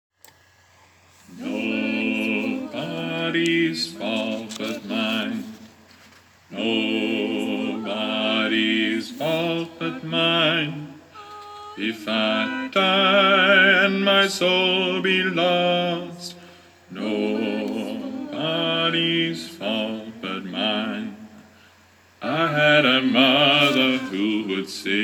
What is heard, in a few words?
bass